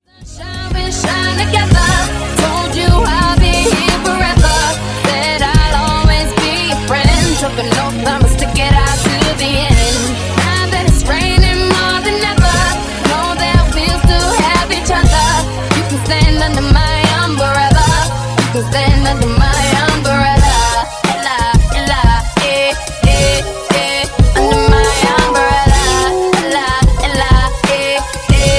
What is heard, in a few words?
Funk 87 95Bppm; Wordplay Transition